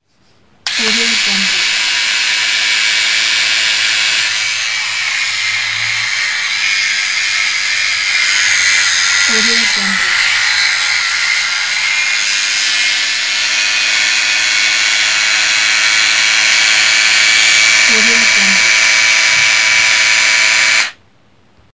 electric shaver

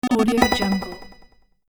sensor ding

